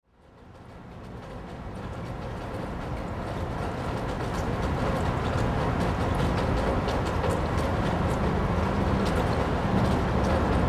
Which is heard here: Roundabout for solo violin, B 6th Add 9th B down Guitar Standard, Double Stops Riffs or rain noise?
rain noise